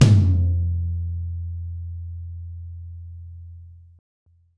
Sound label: TOM, Dance Mega Drums; Best Service